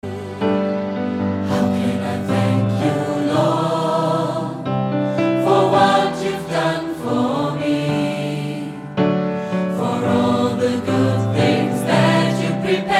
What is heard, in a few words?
Thankful, gospel singers